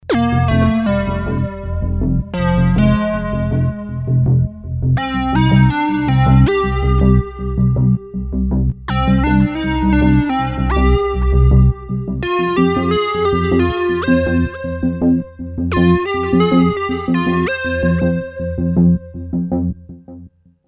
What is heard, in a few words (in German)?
Lead und Arpeggio